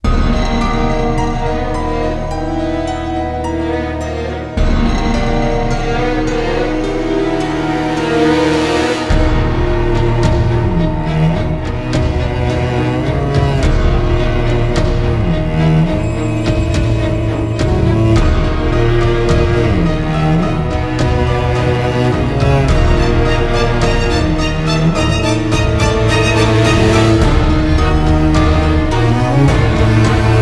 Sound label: Bgm dungeon ship graveyard